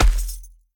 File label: Footsteps Dirt Run; Devour; The Town